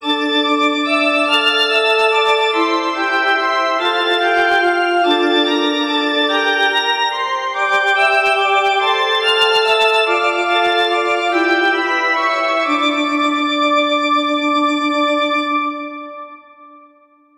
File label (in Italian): Esercizi di contrappunto a due voci; Zweite Gattung; Nottebohm pagina 49